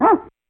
Dog, Casio SK5

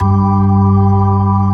B3 ORGAN, Dance Organs; Techno Trance

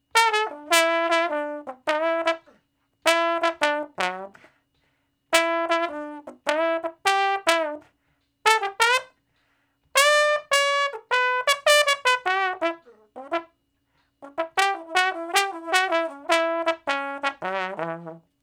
Trombone Shuffle (E); Crimson Blue and Fabulous Horncraft 4 RnB; Horncraft for R&B; Solos

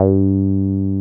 303 OW G3P, 303 OW BS; Drums In Your FACE!

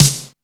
SNR DRY; Back In Time Records - Big Bang

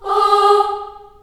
G#3 CHS AH-R, CHILD AHS, Choir; Acoustic & Synth; AKAI; USB Soundscan vol.28

